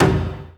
A#3 TIGHT, JAPANI PERC; Northstar; The Wizard VOL-1

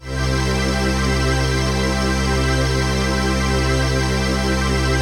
PHASE PAD

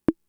Conga, Fricke MFB-522

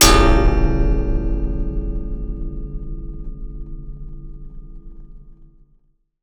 Raw, Wire & Tin, contact mic; musicradar; contact mic madness

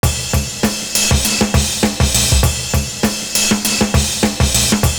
CRASH FILLS; Univers Sons

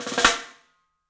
MARFLM, 3D Drums, MARCHIN ROL; 3D Sound

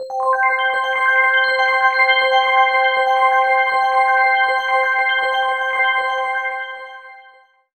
amb, bell atmos; Best Service ProSamples vol.36

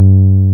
BAS TB303 G3

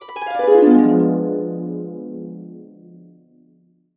D DIM7, Harps; USB Soundscan vol.62 - Complete Harps; AKAI

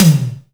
AMB TOM; AKAI S6000 CD-ROM - Volume 4; Snare 2

